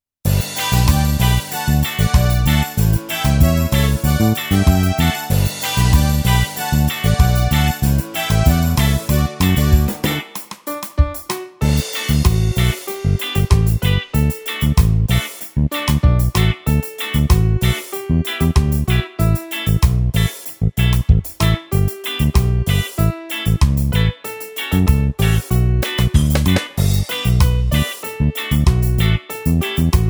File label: Karaoke